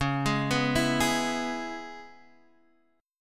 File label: Db Minor 7th, chord; arpeggiated; JGuitar